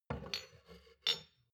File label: shot glasses stack slide soft; soundpool